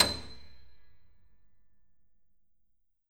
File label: Grand Piano B6 ff